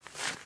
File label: Footstep Gravel; this is the zodiac speaking 2020 gamerip switch ps4 xbox one pc macos